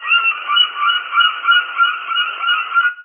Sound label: Gulls; Buggy; PS1